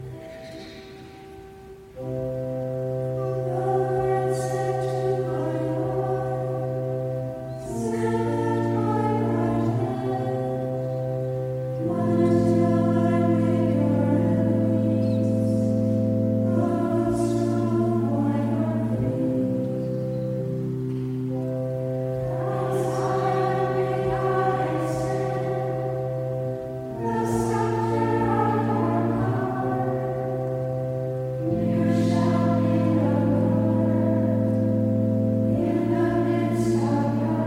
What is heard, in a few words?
tone 7